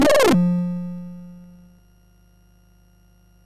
Project Firestart, MOS6581R4

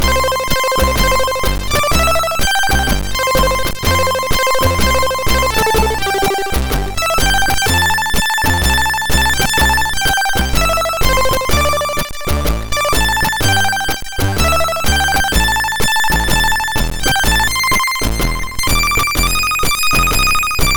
Musicbox, sid MOS6581R4

